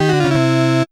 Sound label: defeat; gomoku